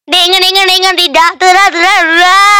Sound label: heheheh